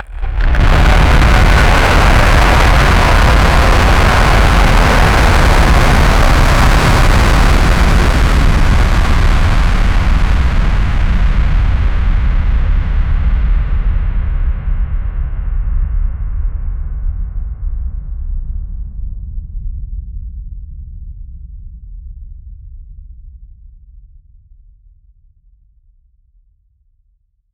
massive monster roar, bass hit

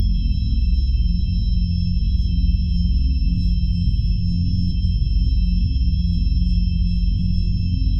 futuristic sci fi ambiance

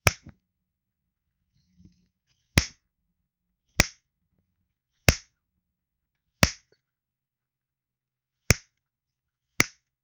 the sharp crack of a whip being snapped

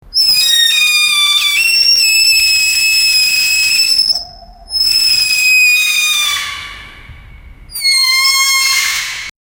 a monkey breaks through; animal noises

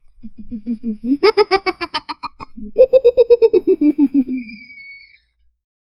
a soft happy giggle; bunny

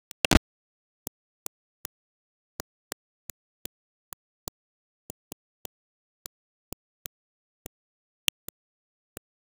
soft and satisfying item, feedback

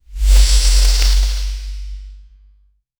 rapid balloon inflating

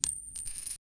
the sound of a coin

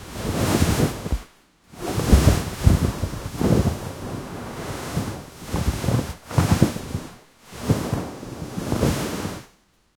heavy cloak movement deep; low wind

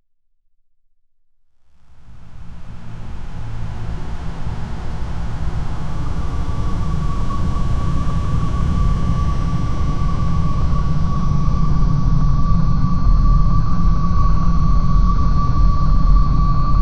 demonic portal soundscape

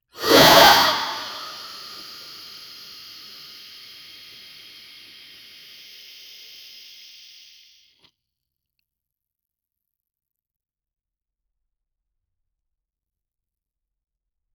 sound effects of stomach; body sounds

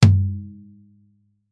Japanese taiko drum; traditional

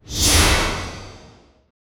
magical spell shot

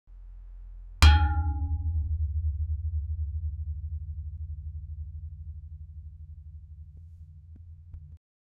metal ball hitting ground

